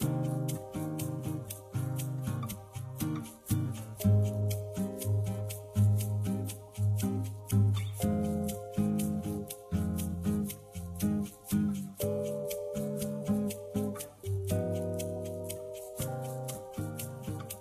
loop bossa down

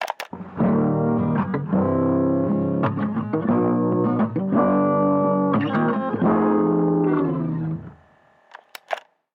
Cheesy Tape Loop

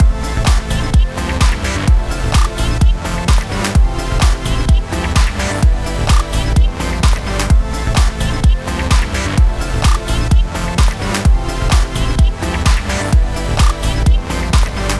Music Loop

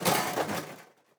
metal break; teardown ps5 windows xbox series xs gamerip 2022

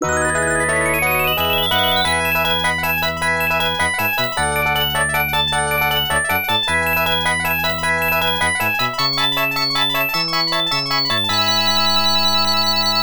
Overwhelming Victory! (All Rounds Cleared); Mega Twins; CP System

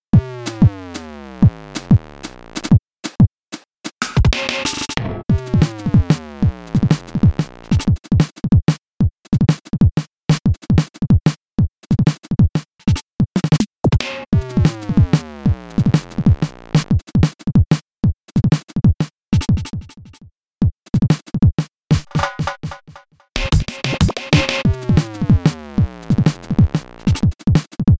rhythm

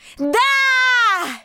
Emote Cheer; The Princess and the Frog; wii windows; Twitch Skijump

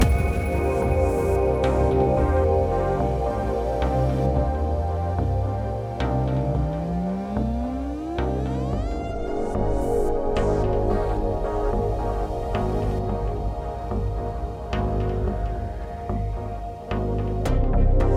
safe space (loopable)

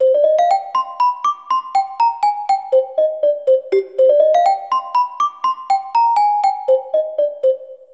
Celesta; Smeshariki Chiko's Computer

